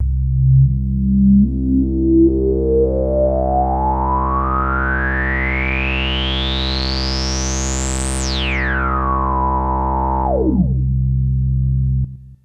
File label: Synth hits and riffs